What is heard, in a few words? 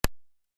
gun anechoic chamber; Trevor Cox; SoundBook